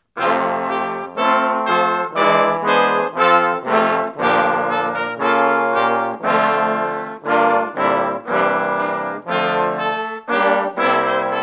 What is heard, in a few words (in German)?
Erstens A Tutti